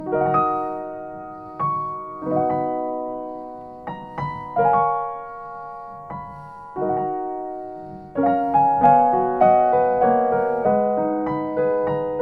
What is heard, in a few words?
Seiler 128 Konsole, piano